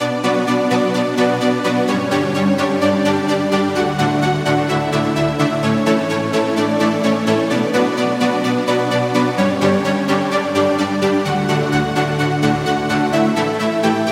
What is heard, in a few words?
Lead; Routed Sound; Serum 2 Expansion - Star Scavenger Vol.1